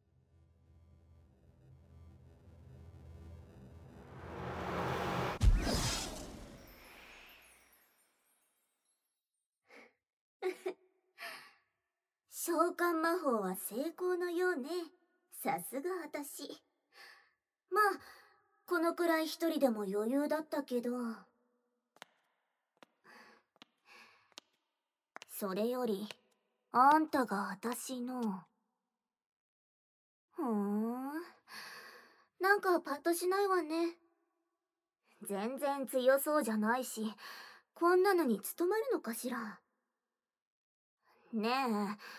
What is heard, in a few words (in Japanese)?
耳舐め, ASMR; あんたがパパになるのよ！屈服おねだり耳舐め; SE控えめ